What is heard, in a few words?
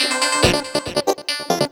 Clav, Funk Keys; Sonic Foundry (Sony Creative Software); Synchro Funk